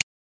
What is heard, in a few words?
focus actionable; braillesense